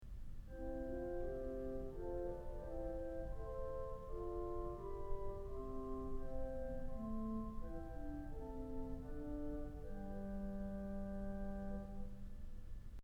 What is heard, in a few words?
Flute; Kellogg Auditorium